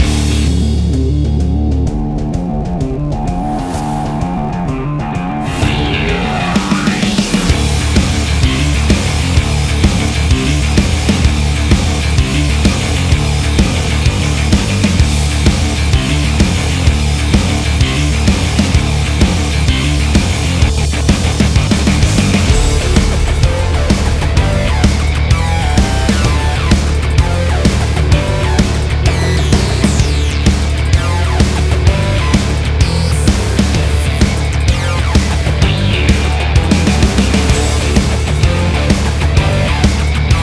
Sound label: Action Sport Rock Trailer; Meow Motors